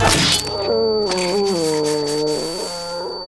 Extreme Pain; From Coconut Crunch; Ed Edd n Eddy; Adromulus Pack; EEnE

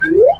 Slip

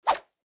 Throw

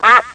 Quack

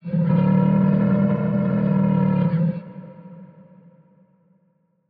Monster - Growl; Ed Edd n Eddy; Adromulus Pack; EEnE

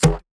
Bonk put down; Ed Edd n Eddy; Adromulus Pack

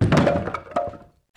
Wood; Ed Edd n Eddy; Adromulus Pack

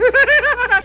giggle

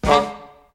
Band Hit; Ed Edd n Eddy; Adromulus Pack; EEnE

